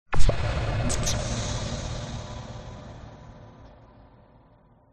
cinematic hit scary loud evolving tail; sound design

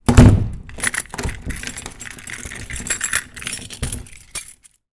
household door close keys lock

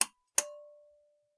household switch light pull cord domestic; sound effects one

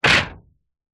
impacts metal crunchy hit sweetener

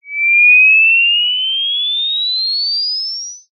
cartoon ascend rise whistle

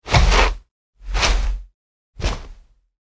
household sitting into chair three times; sound effects one

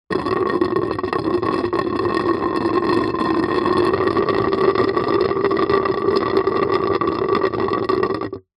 nature water gurgle light shallow

